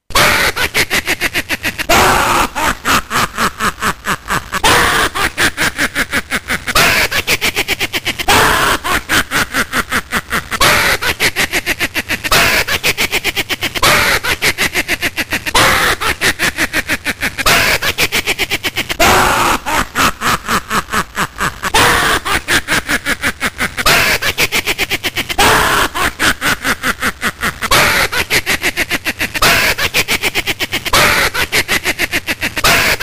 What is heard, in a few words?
laugh; 27mhz